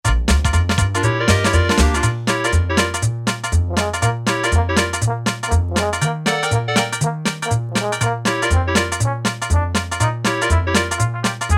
dixieland, Yamaha PSR-300 styles

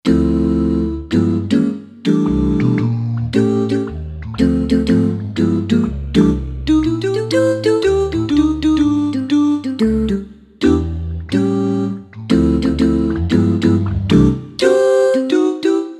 Voice Oohs, Roland SC-880; Factory Tones

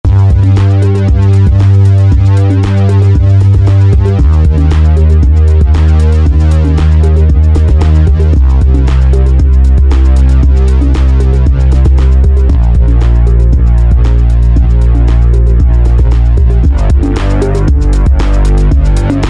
Chillout; Roland SR-JV80-11 Techno Collection

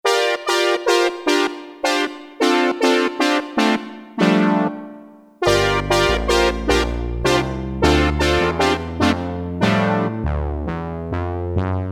SYNTH BRASS, Roland Jupiter-8; Factory patches

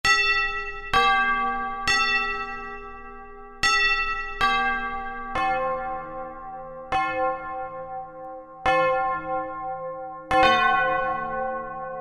Tubular, Korg M1; Factory preset examples

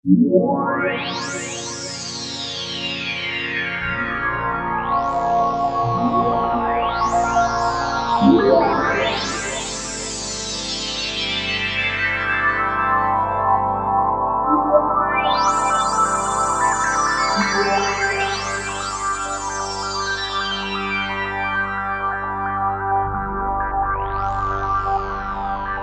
JX3P POLARIS; Akai SG01v

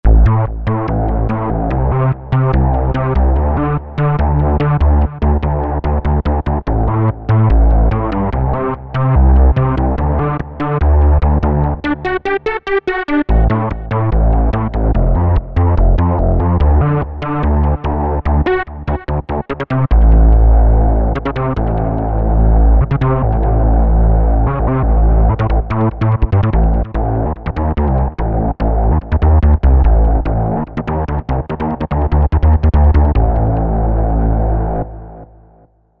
synth leads; Ominous Dancefloor; Apple GarageBand 2

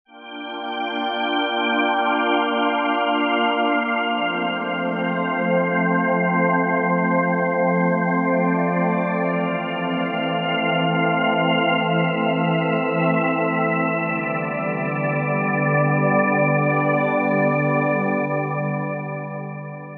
Slow 3D Vox; Roland SRX-07 Ultimate Keys

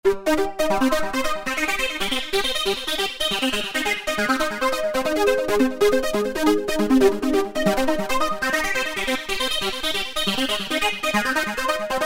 Trancey Arpeg., Korg microKORG; Factory Voices

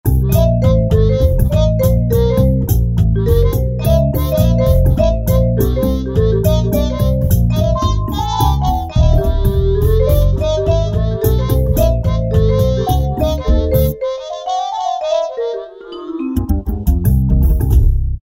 Hyp Badd Jazz, E-mu Morpheus; Factory Presets

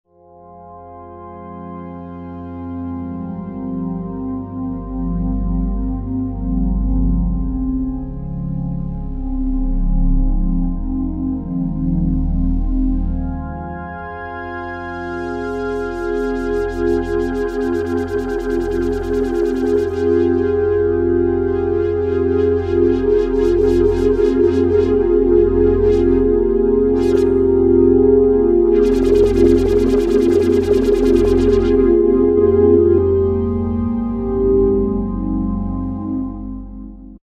Glass Saw, Roland V-Synth; Factory Patches

